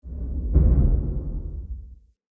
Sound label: alley low rumble; Ambience; Under City